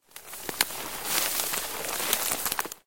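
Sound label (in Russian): шуршание листьев выдает подкрадывающегося зверя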